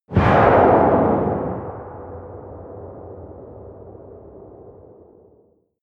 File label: missile large fire airplane distant